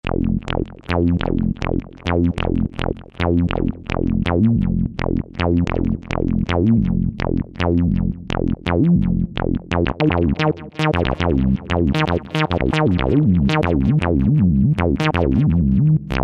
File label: Ba Plastic Box, Yamaha EX5R; Factory presets